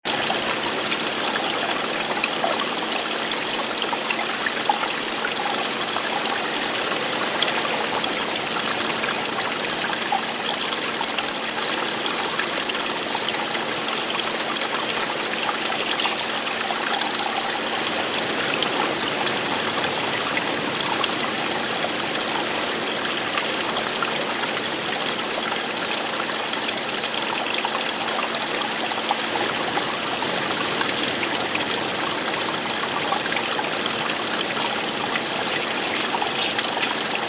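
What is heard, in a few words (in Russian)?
большой фонтан со струями падающими в воду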